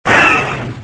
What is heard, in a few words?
30 cal